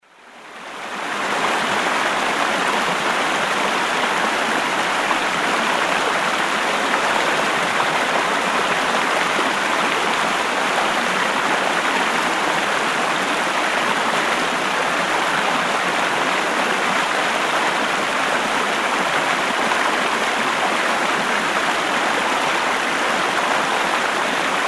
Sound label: River flows